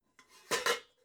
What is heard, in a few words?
pots clank quiet